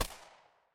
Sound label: impact gunshot